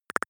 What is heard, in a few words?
click stutter, signale; soundpool